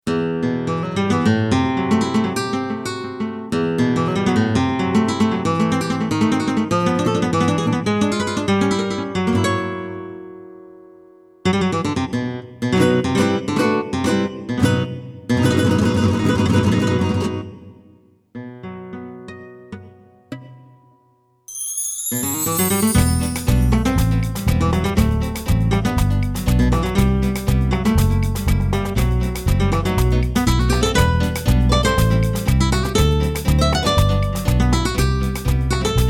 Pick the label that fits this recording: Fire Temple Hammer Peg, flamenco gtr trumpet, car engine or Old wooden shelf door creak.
flamenco gtr trumpet